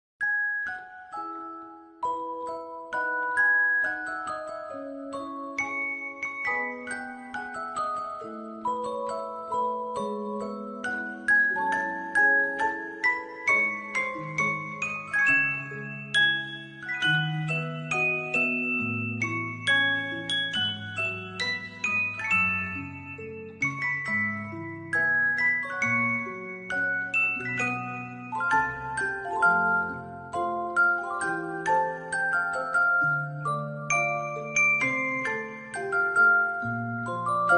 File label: music box ver.